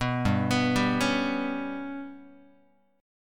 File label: G Major Flat 5th Guitar, chord; GMb5 arpeggiated; JGuitar